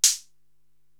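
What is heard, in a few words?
HH, hi hat, drum machine; Korg TR-Rack; Donkamatic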